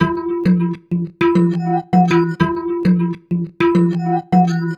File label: Nepal Beat; Electronica